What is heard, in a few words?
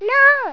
miss letter; Hang2000 v1.1